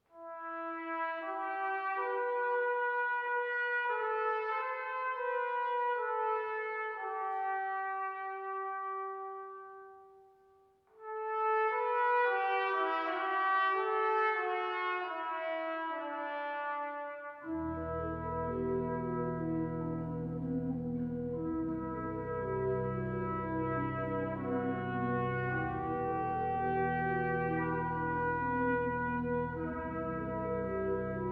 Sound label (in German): o come emmanuel orgel trompete